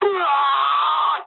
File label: jp aircraft destroyed scream, speech jp